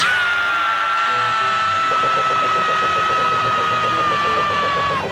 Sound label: screaming; spongebob